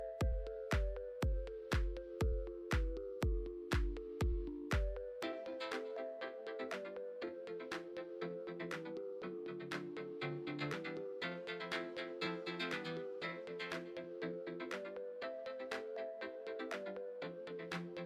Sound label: Grooving